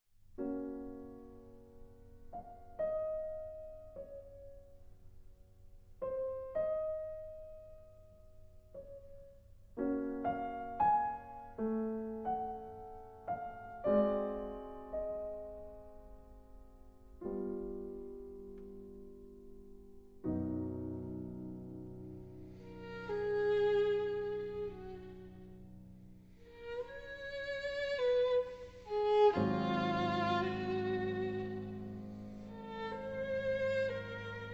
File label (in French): Sonate violon piano